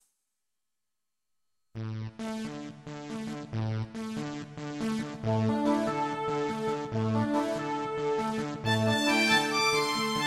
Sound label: Sawtooth Jig